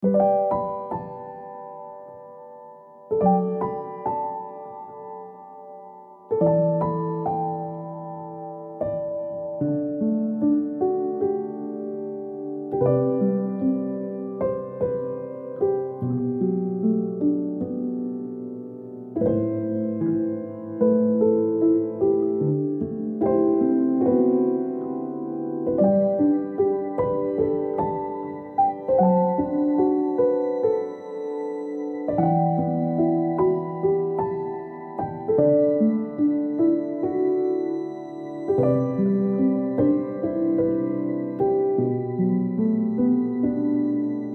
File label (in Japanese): Loop ～ピアノ、ノスタルジック、切ない、悲しい、バラード～; にゃるぱかBGM工房; ballade